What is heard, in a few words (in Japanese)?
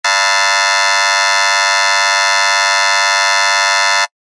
劇場やホールの開演ブザー リバーブ無し; 演劇・舞台